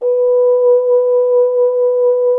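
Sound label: French horn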